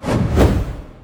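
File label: minotaur whirlwind; minotauro remolino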